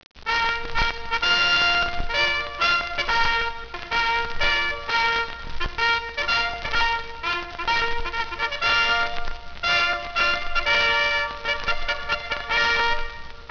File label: Retreat, Bugle; Cliffside